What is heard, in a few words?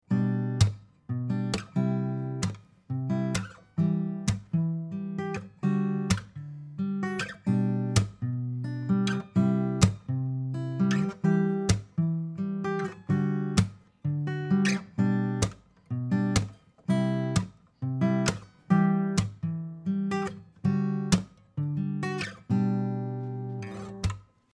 Blue Ember guitar